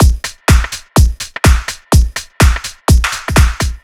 Swing No loop, Drum Loops 125bpm, Nu Dance Electro; Zero-G - Soundclash; Swing Injection